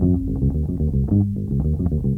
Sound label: bass, Loops Phonky